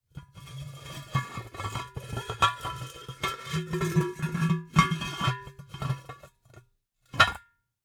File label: Cookie Jar, ceramic, glazed, irregular shape, hollow base, hollow top, rough, resonant, tonal, jostle; builds; Eiravaein Works; Vaeyan IV